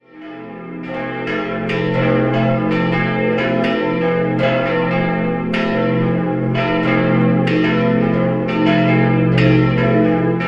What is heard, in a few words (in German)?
Glocken, Burgkunstadt, Pfarrkirche St. Heinrich und Kunigunde; 1925; Firma Gugg in Straubing